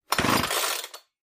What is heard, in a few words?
chainsaw pull